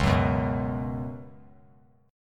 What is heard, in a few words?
Db 7th Suspended 4th Db down; JGuitar